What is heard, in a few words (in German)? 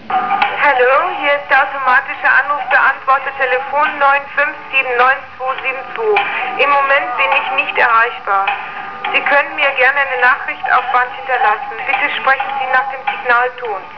ANRUF